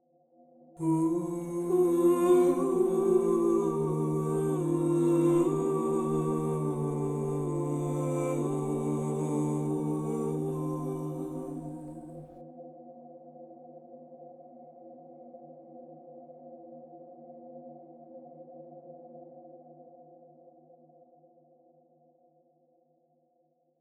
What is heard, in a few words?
Dissolve